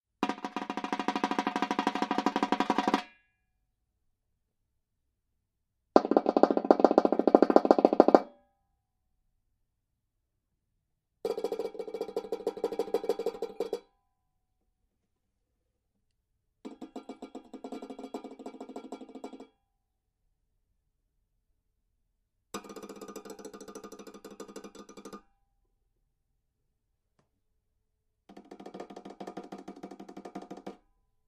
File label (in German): fingernagelklopfen, banjo